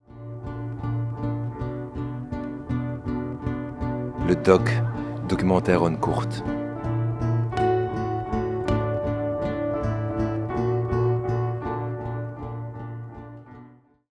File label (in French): jingle; le doc